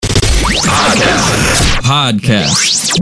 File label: podcast jingle